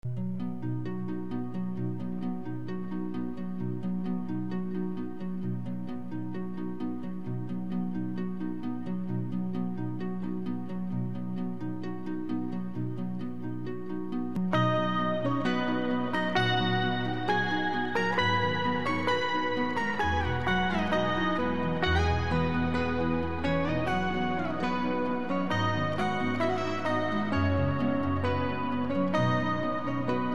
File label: celtic rain